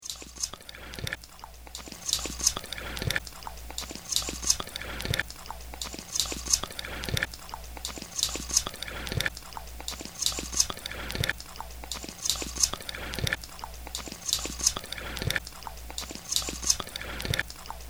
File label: noise loop